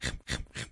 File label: eat